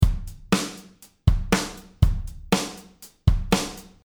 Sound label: 8 beat